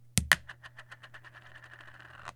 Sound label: Plastic Cover Falls